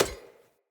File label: Unarmed Fey Parry Impact; Weapon Impacts; Player; Combat